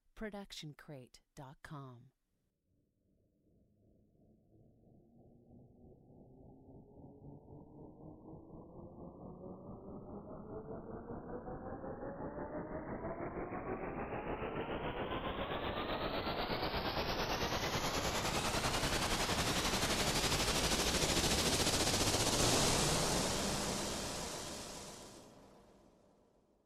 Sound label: Cinematic Riser